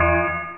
klank

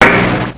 CRASH

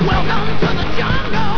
jungle

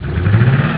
get nitro

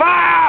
fire!

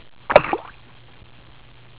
DRIP

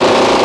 uzi

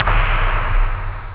EXPLOSION; ToTheMoon!

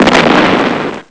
EXPLO

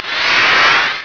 FADEIN

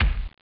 foot thud

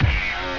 GUITAR

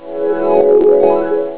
HEAL; BARTQST

